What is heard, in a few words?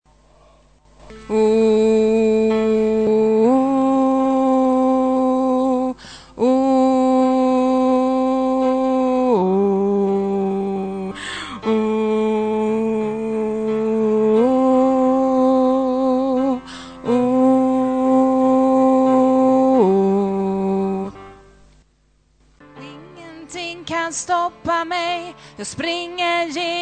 tenor